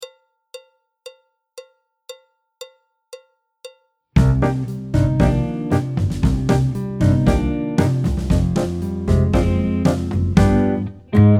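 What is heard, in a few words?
Tango backing track, Jazz; alto, tenor, trumpet, trombone, piano; Jazz Syllabus Level 2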